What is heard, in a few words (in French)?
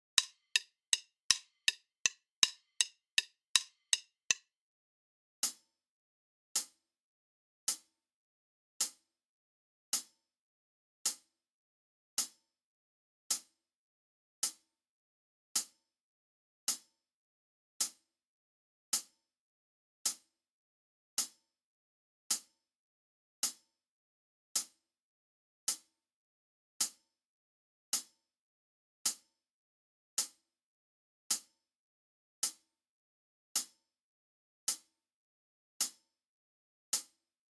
decalage triolets exercice batterie tempo 080